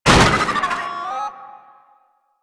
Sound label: Jammer Destroy; Warframe; Nineteeen Ninety Nine; PvPvE